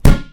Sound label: rubber tire impact bullet; Portal 2